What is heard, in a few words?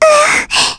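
Vox Damage, Korean; heroes; Jane; kings raid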